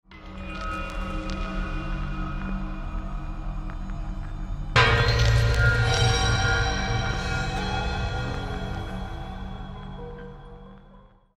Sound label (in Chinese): 打开铁门后不循环背景音